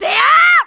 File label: Spin Attack; Link's spin-attack shouts